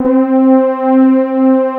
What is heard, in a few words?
PAD C4; East West - Global Sounds; SD1 PADS